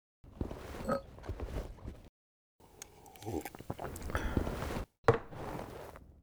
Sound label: John Drinks; yesterday origins gamerip 2016 switch ps4 pc ios macos